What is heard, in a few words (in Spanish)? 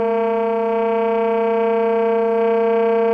Tremolos